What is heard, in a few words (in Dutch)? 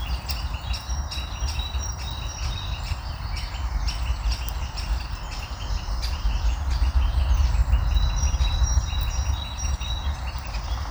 specht Grote bonte specht Dendrocopos major roep; dieren